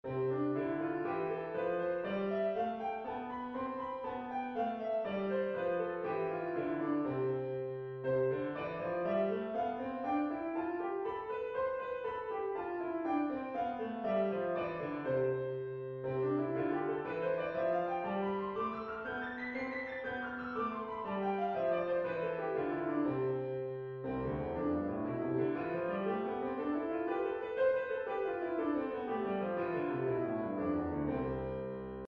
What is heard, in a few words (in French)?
exercice d independance